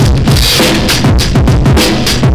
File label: breakcore breaks